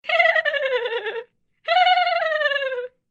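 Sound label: sms ringtone idiotic laughter; idiotskij smeh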